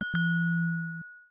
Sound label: wine glass toast, notification; Samsung Galaxy Z Fold 7; SoundTheme Galaxy